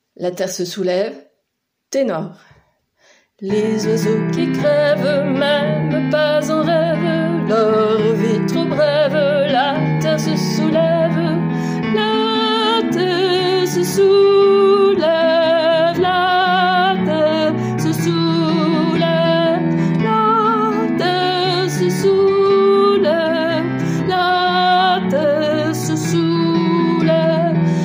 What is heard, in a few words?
tenor